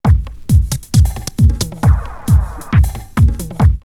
Noize 135, drumloops, Techno&House; X-Static Goldmine

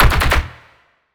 bass shot

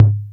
CR-8000 KIT; Rubber Chicken Software Sample Bank; DRUMS + PERC

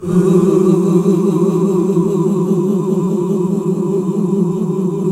GOSP. Oooh, Roland; Sound & Vision - Gigapack II CD 1 (Roland); HAL Gosp. Oooh 1

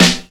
SNARE, DRUMS; USB Soundscan vol.02; Underground Hip Hop; AKAI

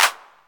808 Clap

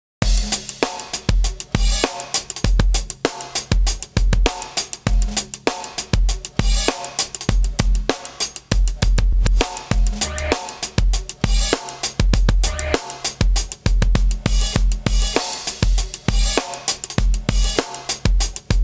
Punch'n'Groovy 99; AMG - Ruff Cutz

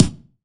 BD, BASS DRUMS; EdgeSounds

